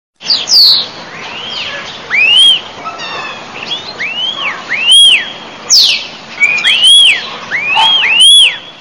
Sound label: starling birds; animals